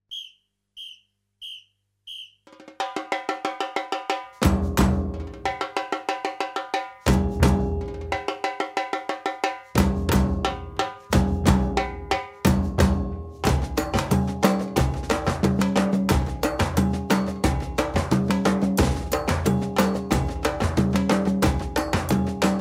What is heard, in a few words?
groove